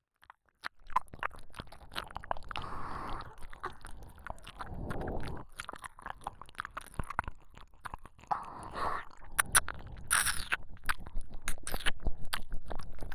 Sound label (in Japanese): 耳舐め